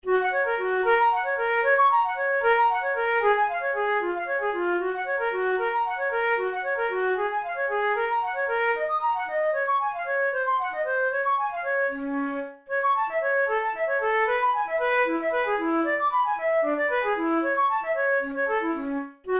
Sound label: studies for the boehm flute; drouet 72